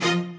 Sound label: strings